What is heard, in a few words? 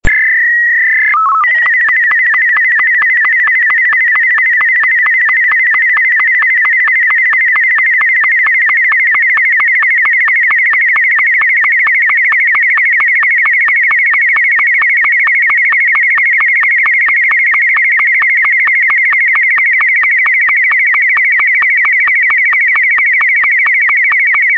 martin m2, sstv